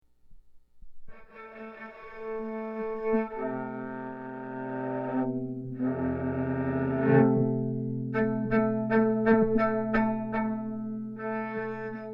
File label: preamped violin